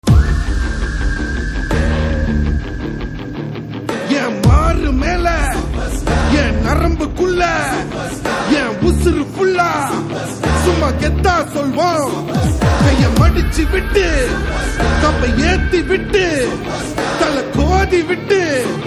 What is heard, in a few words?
Anthem Ringtone; Friendship